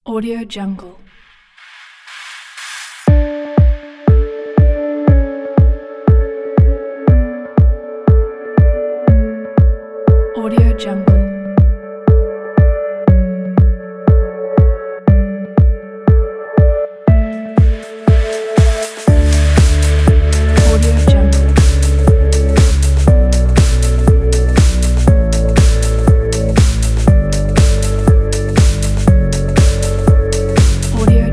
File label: dreamy dance